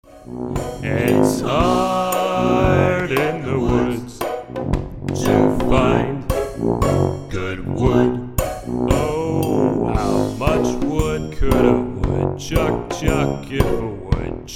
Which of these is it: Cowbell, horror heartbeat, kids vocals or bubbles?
kids vocals